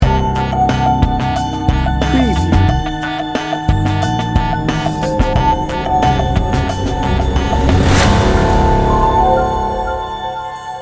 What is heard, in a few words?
night terrors sting bumper; Bouncy and playful. Featuring a quirky melodic guitar and a determined bluesy rock groove.